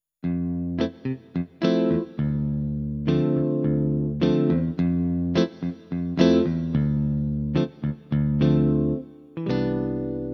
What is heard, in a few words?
Katron SD5 Guitar